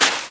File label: ghoul step